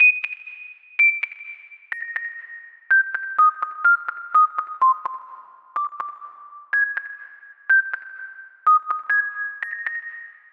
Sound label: Synth Bell Pulses 125